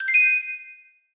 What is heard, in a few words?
Pickup Gem Bells; SFX Kit; Pickups